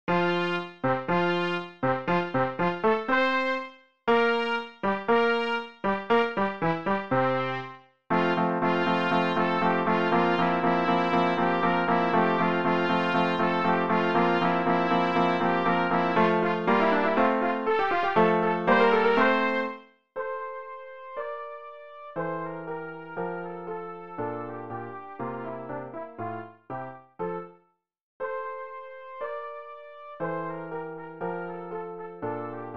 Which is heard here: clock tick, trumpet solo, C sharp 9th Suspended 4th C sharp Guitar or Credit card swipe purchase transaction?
trumpet solo